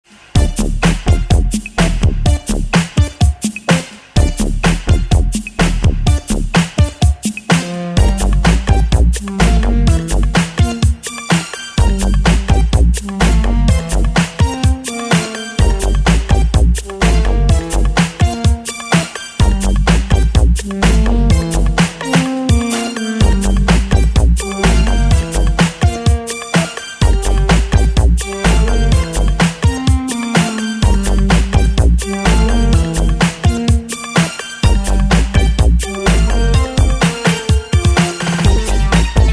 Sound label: string orchestra